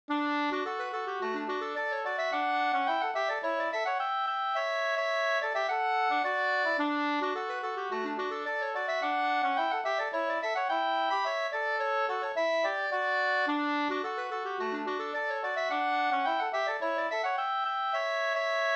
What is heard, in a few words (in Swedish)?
slangpolska